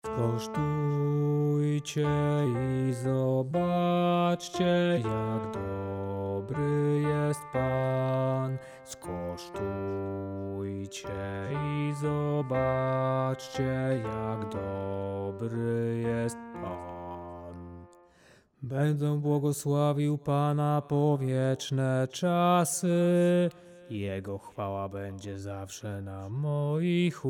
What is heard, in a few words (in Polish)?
bas; Skosztujcie i zobaczcie